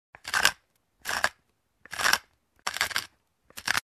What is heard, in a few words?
Pepper Mill